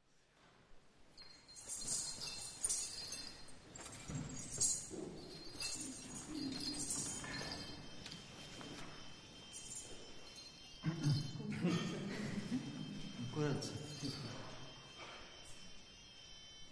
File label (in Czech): uvod k fuze F dur